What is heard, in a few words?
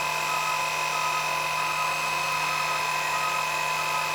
Sercurity Camera Motor; Warframe; Security Camera